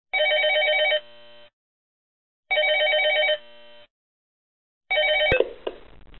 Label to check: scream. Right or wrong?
Wrong